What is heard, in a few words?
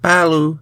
Chorus voice; Frogsong